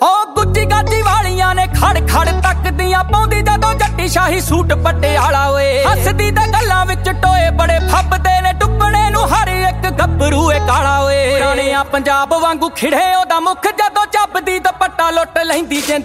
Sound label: new punjabi song ringtone